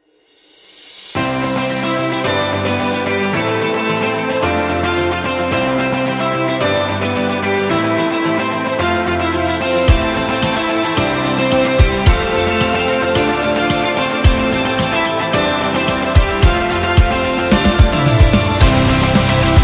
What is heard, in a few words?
Upbeat; office background